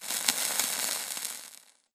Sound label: imp bullet energy default sizzle; Titanfall 2